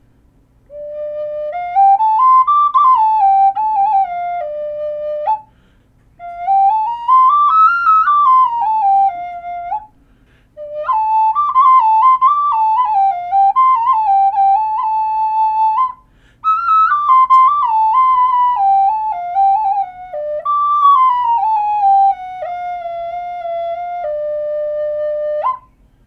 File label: D5, flutes